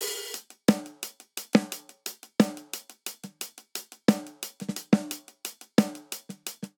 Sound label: Straight Five Rock Snare & Hihat 5/4 177 bpm; Ableton; Factory Packs; Drum Booth